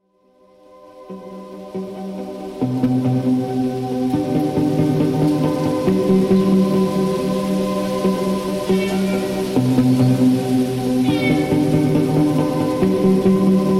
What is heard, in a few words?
Electronic Recordings From Maui Jungle; Editions Mego